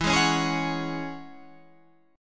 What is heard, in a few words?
E 6th Add 9th E down Guitar Half Step Down